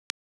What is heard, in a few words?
ideal steel wood floor